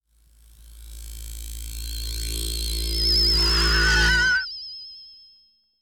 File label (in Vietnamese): Âm thanh phép thuật xuất hiện